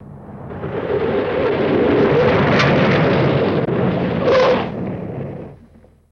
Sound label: Car Skid Stp On Dirt